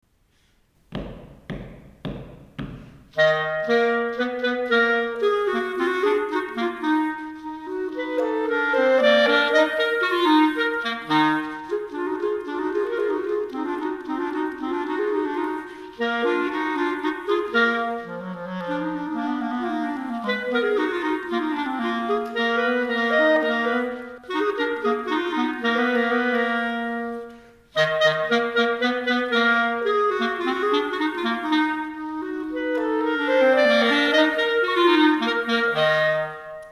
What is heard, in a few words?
Skilful Duet; Skilful Duets